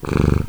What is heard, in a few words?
Kitty Purr Stereo Dithered; libnyquist